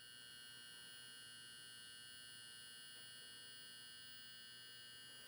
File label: loop, Train; OpenBVE; Jerome Yard